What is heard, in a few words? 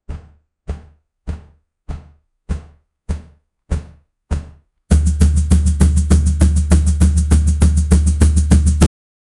samba victory; create a samba victory fa